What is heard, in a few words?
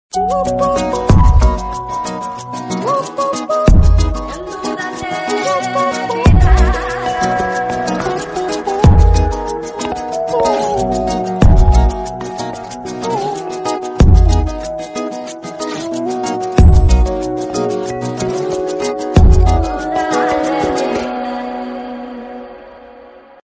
romantic love background music tone ringtone